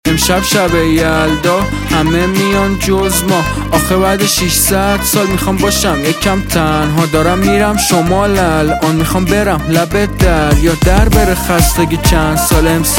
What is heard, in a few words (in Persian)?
Rap